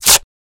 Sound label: Tape Rip Bigger